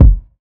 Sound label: short kick drum single hit f key; drum single hits one shots; kick drum samples